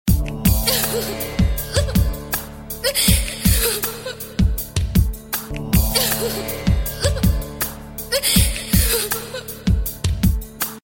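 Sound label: Crying Girl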